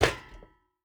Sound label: footstep boots metal jump land; Rust